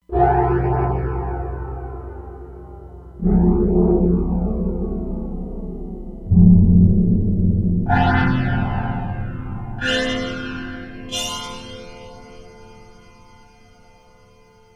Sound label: Gongs, E-mu Proteus-3; Factory patches